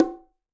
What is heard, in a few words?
Bongo L Open; Bongo B L Open 3F; Latin Percussion; Drums; Drum Hits; Ableton; Factory Packs